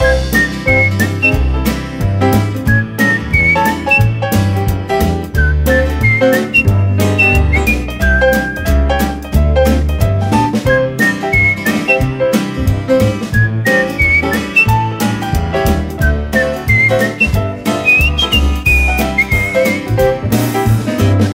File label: jazz and whistle